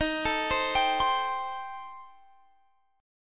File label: D sharp 6th Flat 5th, D sharp, down, Guitar, Standard; D#6b5; arpeggiated; capo=2